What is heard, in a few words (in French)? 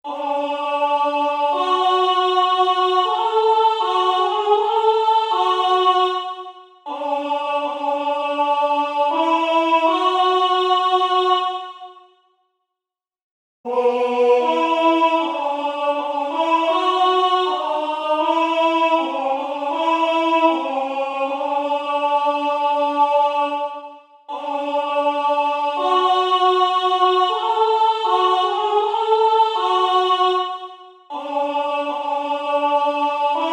Voix Basse